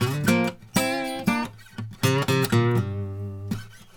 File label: Blues Guitar; ILIO; Fretworks; LICK